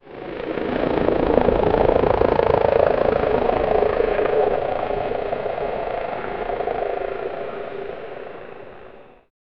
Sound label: police helicopter fly; law enforcement